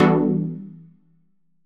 Synth House Emin11

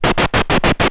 steps; BYOND

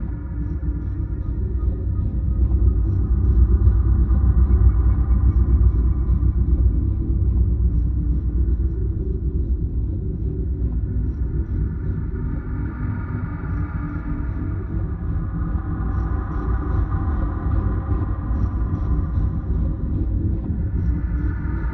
Guardian Activation Room Amb; Cave; Journey

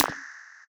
E-Perc Strike Shadowed, Electronic Percussion; Drums; Ableton Live 12 Lite; Core Library

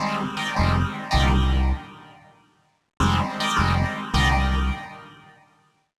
Phasersynth F 80 bpm; Loops; Tonal; Ableton Live 12 Lite; Core Library

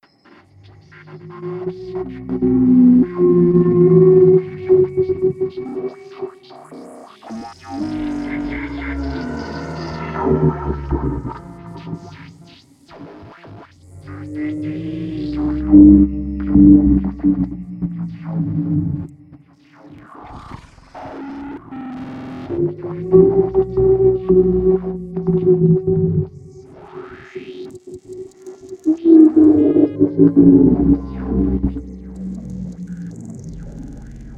Guardian Mech Loop; Journey

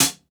Hihat Closed

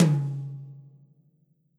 Tom Stick Hit Hard Dry Session; Drums

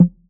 Tom Analog; Drums

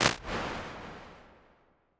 Bigger Spaces Open Space L, Impulse Responses; Ableton Live 12 Lite; Hybrid

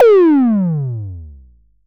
Tom Fat; Drums

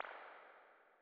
Springs Space Echo Spring, ImpulseResponses; Ableton Live 12 Lite; Hybrid

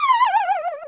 fall in hole; Open Zelda; Quest Designer 2.0.4b

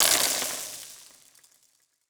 Textures Marbles; Impulse Responses; Ableton Live 12 Lite; Hybrid

